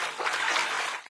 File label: wade, player footsteps survivor walk